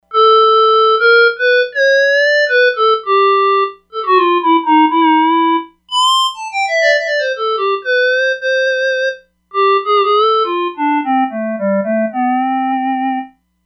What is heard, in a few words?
Clarinet, E-mu Proteus-3; Factory patches